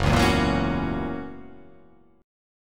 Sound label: F sharp 7th, guitar